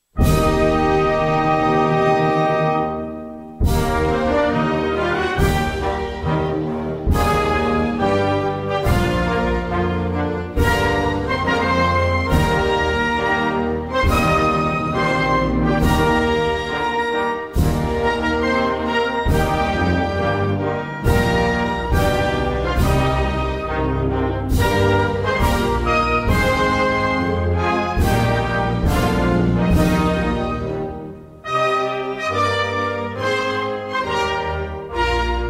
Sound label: anthem